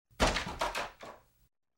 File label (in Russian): otkryvanie hlipkoj dveri